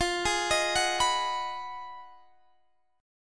B 6th F, guitar chord; B6/F; arpeggiated

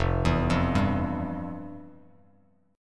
F Major 7th Suspended 2nd, Bass Standard; arpeggiated; FM7sus2; JGuitar

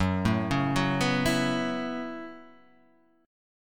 F sharp Minor 11th, Guitar, Half Step Down

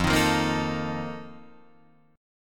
F 11th F, down, Guitar Drop D

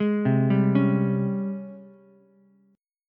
G sharp Major 11th G sharp, Ukulele C6; JGuitar

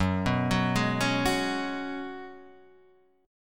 Gb Suspended 2nd Suspended 4th; Gbsus2sus4 arpeggiated; x,x,4,4,6,3,3,3

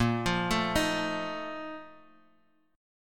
Bb Major 7th Suspended 4th, Guitar; arpeggiated; 6,8,7,8,x,x; 4E,4A,5D,5G,5C,6F; BbM7sus4

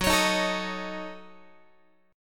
Gb Minor 6th, Guitar; Gbm6; strummed; x,14,16,13,13,14

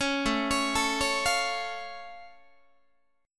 A Augmented A down Guitar; arpeggiated; 16,17,16,19,17,16; JGuitar

